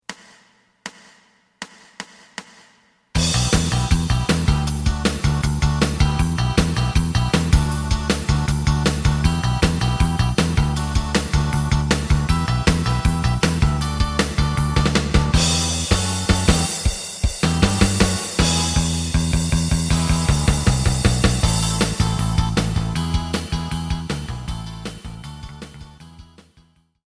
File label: lead; エレキバンド用カラオケCD